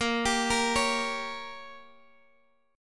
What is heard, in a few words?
A sharp Minor 6th A sharp down Guitar Open D, chord